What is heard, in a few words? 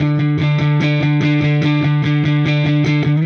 Silos Dirty Strum Guitar, Indie Rock